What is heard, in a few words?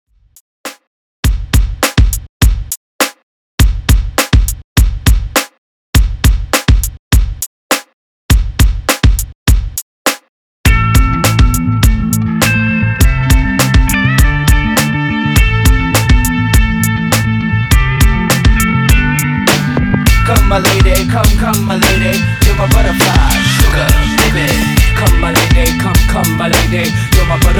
rock; Rock Redrum